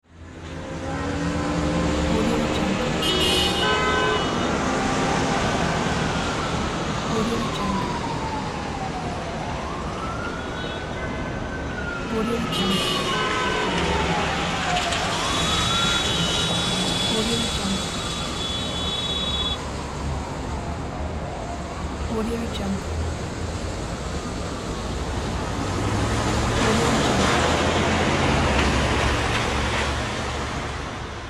city noise

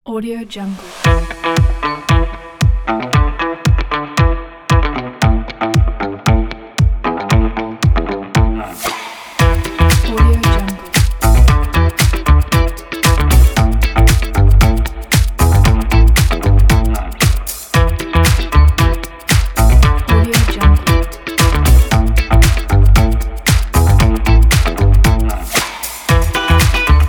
upbeat funk commercial

